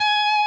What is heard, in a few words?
Left-hand vibrato